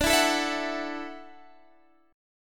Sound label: Gb Minor 7th Sharp 5th, Guitar